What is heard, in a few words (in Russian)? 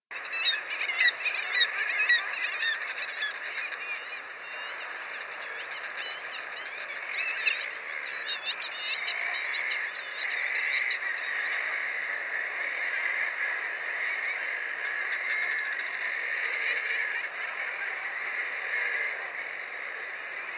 moevka golos